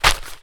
paper sheets many crumpled compress stand on; foley; zapsplat

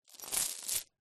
industrial chain medium drop to ground; zapsplat

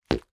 cartoon gulp swallow

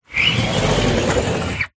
household window thin garage sliding glass

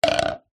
foley plastic bottle down on basin; zapsplat

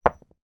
industrial large screw drop on carpeted floor

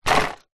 transport bicycle drop impact on wheels

